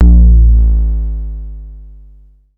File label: Kick Overdrive Siner